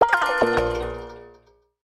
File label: result fail